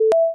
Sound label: start